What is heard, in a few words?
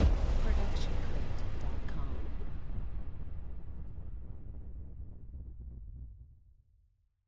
Cinematic Hit